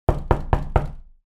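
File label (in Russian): пальцами по массивной; Звук стука в дверь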